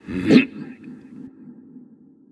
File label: nv grunt lift; cernunnos